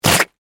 Mud Gurgle, Impact, Mud, Gurgle; Miscellaneous Impact

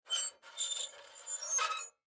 Metal Squeak Swing Rusty Scrape; Foley; Blastwave

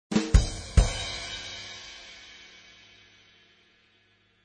Jazz drum accent at 180 bpm.; Jazz Drumroll Accent Loop; Drum Loops

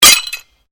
GLASS SHARD SMASH

